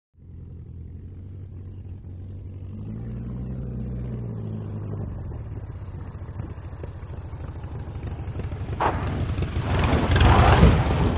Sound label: Tiger Moth Inland By